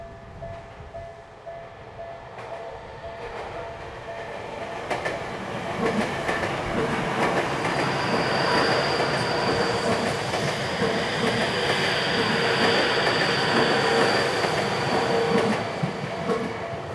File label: train